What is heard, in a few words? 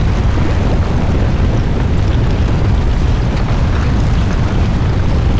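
LAVA Rumble Large, ambience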